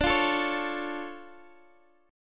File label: D Minor Major 7th D, guitar; strummed